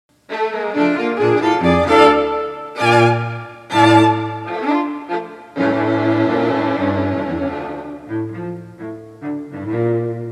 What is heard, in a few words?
Tango